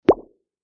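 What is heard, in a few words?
Bubble Pop Electronic; bubbles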